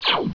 visor ray

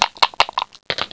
dice

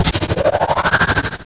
level complete

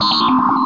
pod lost

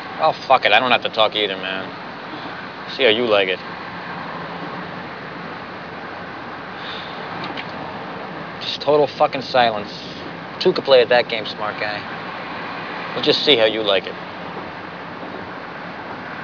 silence

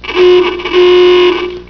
car horn old

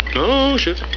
ohhhh

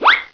Cartoon Streak

